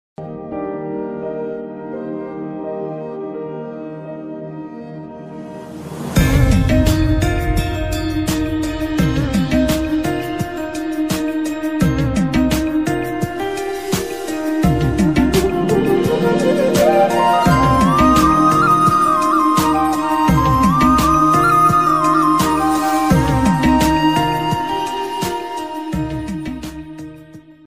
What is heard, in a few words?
movie bgm ringtone love bgm ringtones romantic bgm ringtones telugu bgm ringtones; mukunda movie